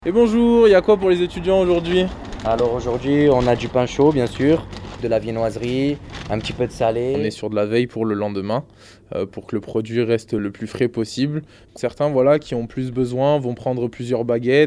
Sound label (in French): son boulangerie